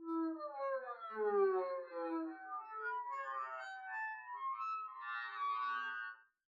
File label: arco noise harmonics messy, double bass; dsmolken; noises